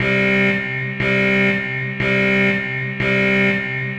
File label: Doubt, Trailer Alarms; Federico Soler Fernández; Effective Trailer Alarms